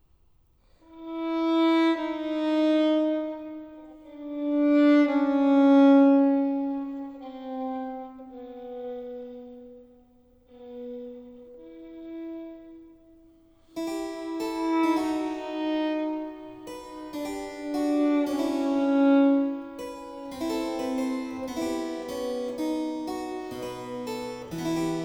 Violin Sonata in A Major, III. Adagio; Corelli; Violin Sonatas Op. 5; La gioia; Lina Tur Bonet; Musica Alchemica

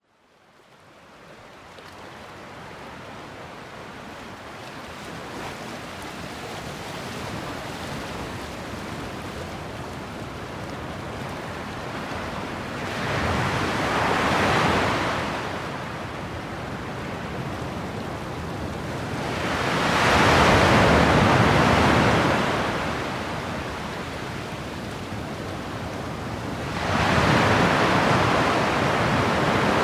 rain and thunderstorms; Sleep Sounds of Nature

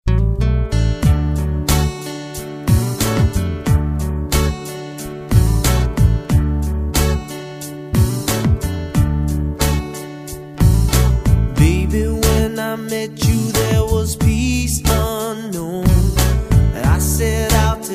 REGGAE-Hawaiian

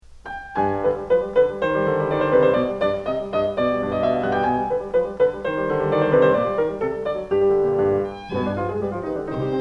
Concerto No 3 Op 37 C Minor Rondo Piano; Toscanini; Rubinstein

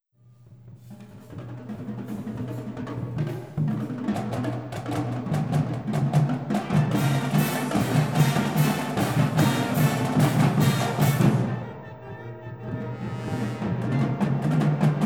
Marching Band Test; World Music; Isomike Super Test 2008A; Audiophile Sound Italia

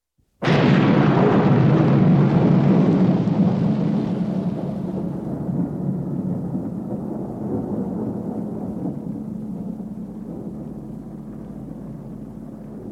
Thunder Light And Heavy Rain; Nature Sounds; Sounds of Nature Collection

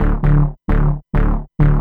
bass, dance

